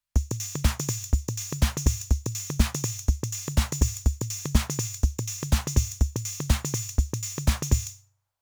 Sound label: Beat